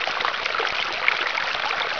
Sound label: water; persia; hl1mp; valve